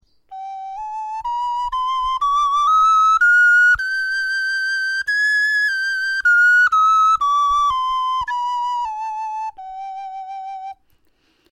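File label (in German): tonleiter, Knochenflöten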